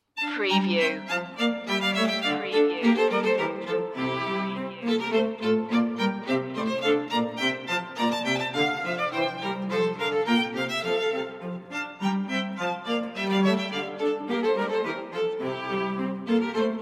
music for the royal fireworks hwv 351 mov 2 bourree string quartet; handel